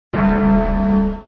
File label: gong